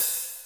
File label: BEAT KITS, OH; Crypton - DrumBase5000